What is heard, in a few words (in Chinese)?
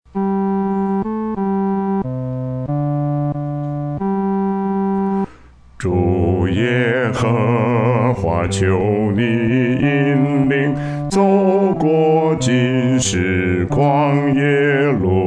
独唱 第四声